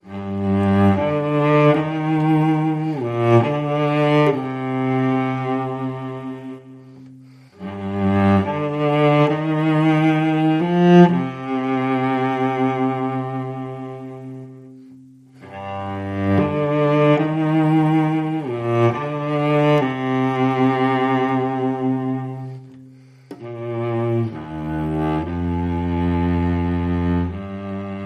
Intimate

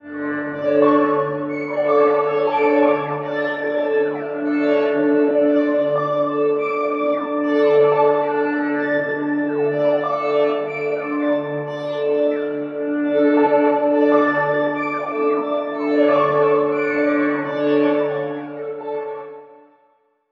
Quirky Synth Sparkle Pad

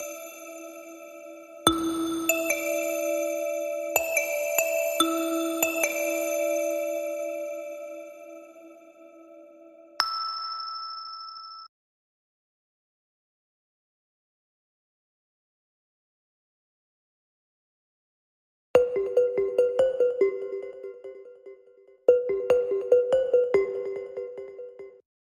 Bells

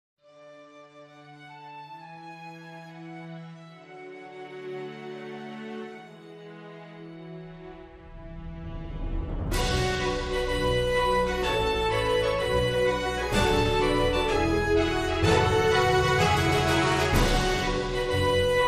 Nordic Theme

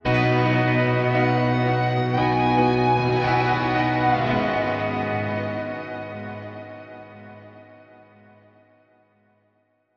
Sting 2 (Ambient Ver)

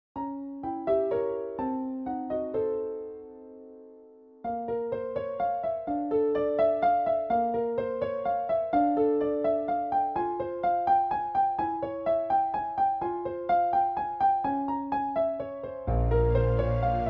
The Haunted Manor House, Ghostly Pianist

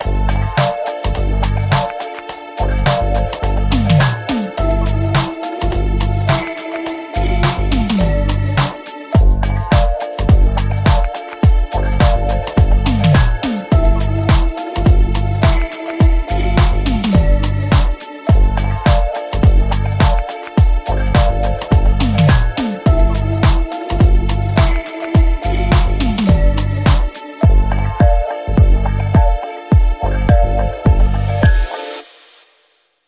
Frostbite Funk, Rhythm

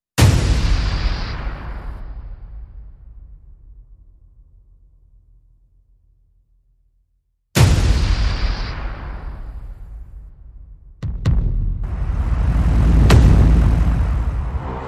Perc And Pulses Only

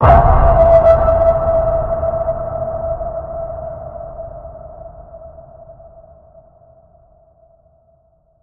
Deep Waters Ping, E

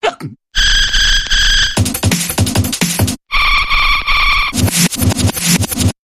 Hic Town Dance Bumper

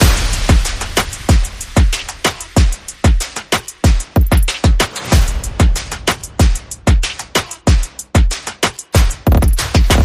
Clubhouse Swagger, Percussion and Effects

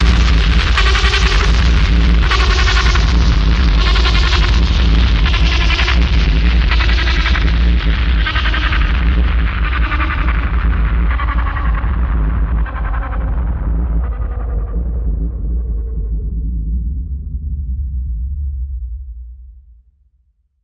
Pitch Shifter

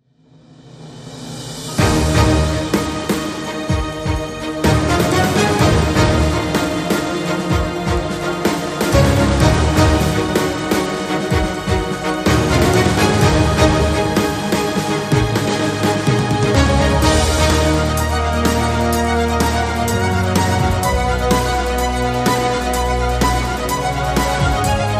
No Choir

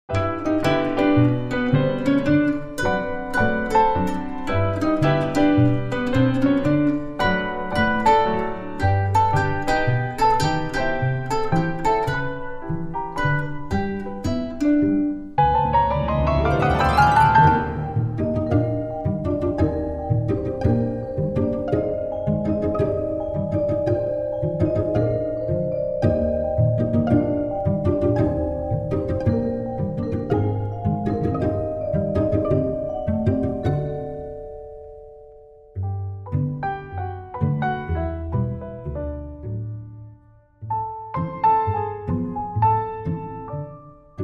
Plucked Piano

